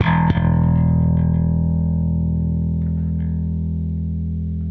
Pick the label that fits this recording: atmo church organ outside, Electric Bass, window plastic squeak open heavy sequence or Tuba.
Electric Bass